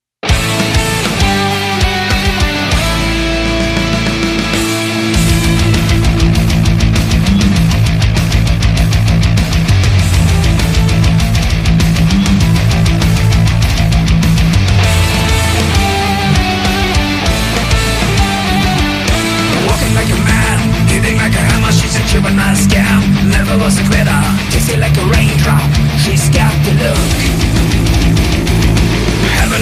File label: Metal cover